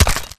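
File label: impact bullet generic headshot human; Titanfall 2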